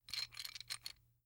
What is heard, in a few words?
Metal Bolts; Fairlight Sound Lib